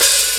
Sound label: OH DRY; E-Lab; X Static Goldmine 2; akai